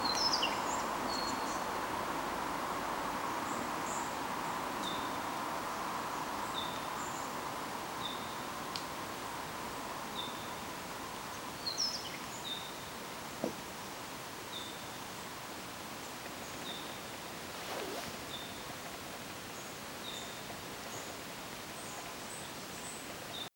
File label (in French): Calme de la forêt